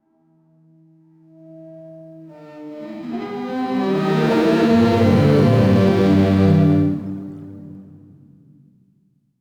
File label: firing strings horror